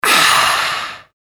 male ahh refreshing

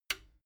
metal button stereo click power on

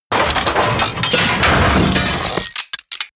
robot transformation metal large

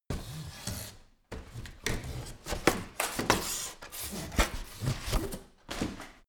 recycle bin move

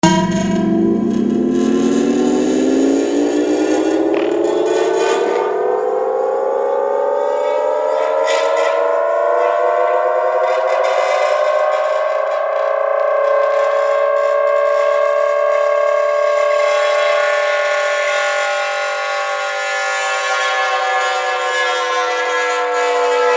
generator

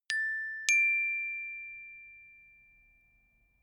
notification glockenspiel fourth up

